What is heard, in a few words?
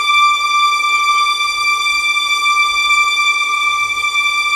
tremolo; Level4 Hidden World